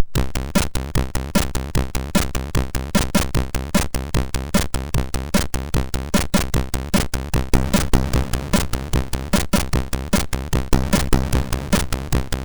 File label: sid, MOS6581R2